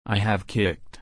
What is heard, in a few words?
kick